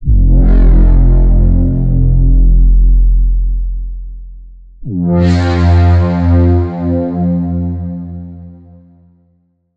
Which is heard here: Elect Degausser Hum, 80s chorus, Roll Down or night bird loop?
Roll Down